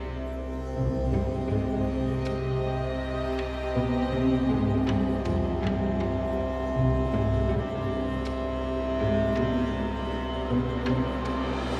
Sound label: Freezing Peak Wooden Cabin